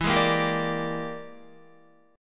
E 7th Sharp 9th Flat 5th E down Guitar 4F 4A 5C 5G 5C 6E; E7#9b5 strummed